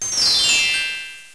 Crystal; Treasure Galaxy (1994); Learning Company

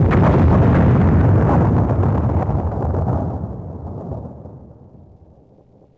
eruption; DAoC

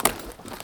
MED HIT; LEGEND98

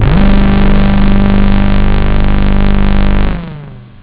hi synth

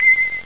bim

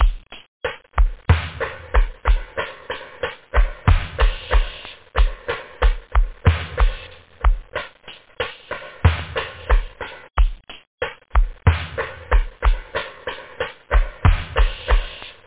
dance, house loop; da capo vol1

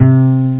up bass

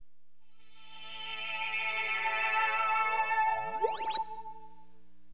HipHop, Keyboards; LiveAct Pool 1

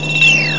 dissol

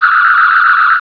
Ground Phaser; Star Trek demo; Star Trek Audio

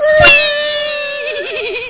skid

